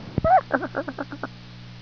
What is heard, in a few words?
laugh